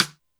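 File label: Snare Stick, Alesis D4 drum machine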